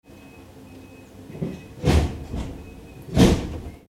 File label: train doors closing and beeping